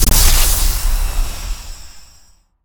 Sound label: Lightning Strike Aim Start; Evolve; Elder Kraken